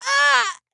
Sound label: Hurt